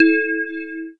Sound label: chime in, Synths; Notes